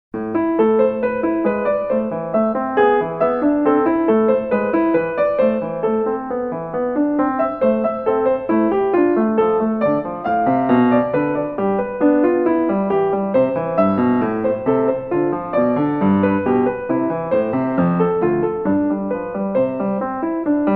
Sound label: Invention In A Minor; Marlais Book 6